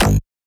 wobble

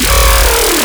bass purge

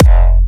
Kick

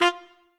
Trumpet melo

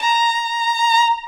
violin

